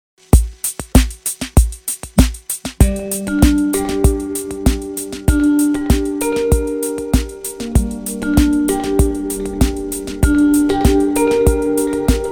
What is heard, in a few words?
pandrum; CINEMATIQUE